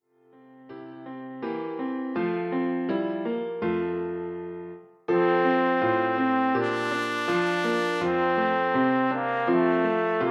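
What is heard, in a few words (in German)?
O du froehliche, Posaune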